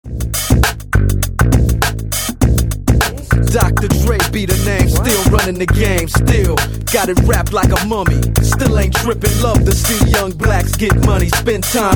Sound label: Beat